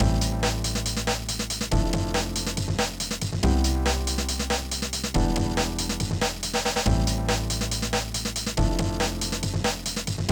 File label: Instrumental; Friday Night Funkin' Mind Games OST PC mod gamerip